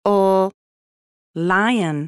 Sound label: LION